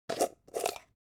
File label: object jar plastic midsize open short